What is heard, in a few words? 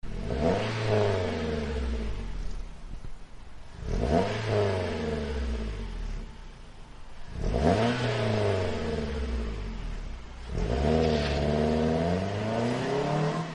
magnaflow exhaust systems